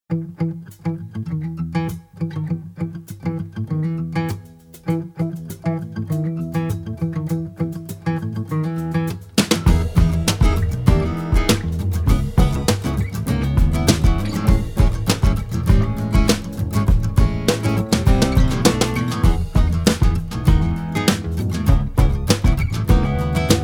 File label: Acousitc